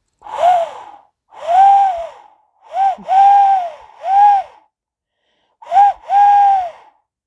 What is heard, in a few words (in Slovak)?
sova mala, hudobna sova